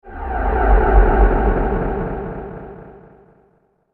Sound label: Engine Whoosh Resonant Power; whoosh sound effect; Andrew Fleming